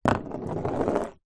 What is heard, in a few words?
office pencil down then roll on empty cardboard box